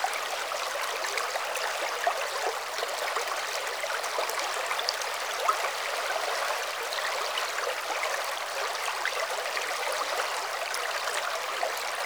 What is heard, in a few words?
Water Small Creek Loop; Flowing